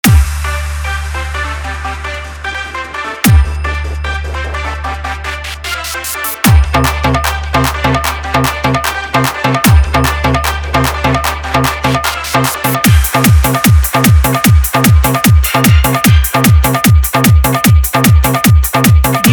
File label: Dance Bass Mix; Full Rod; Dj King Anurag BaBu Jaunpur